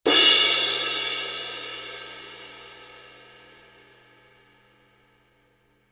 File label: 2002 black 20 big beat edge, paiste